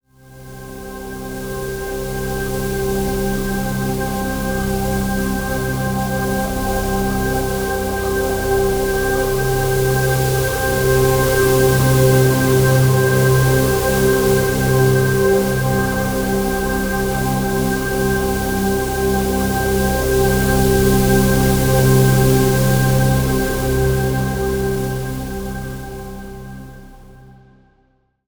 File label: Synth Ambient Noise - Cm 70Bpm; Construction Kits; STEMS; Ghosthack; SkyRealm; Kit 19 - Ode To Daenerys